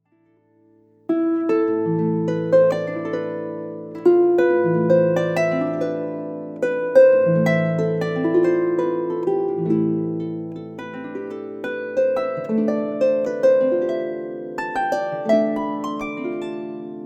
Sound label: Blues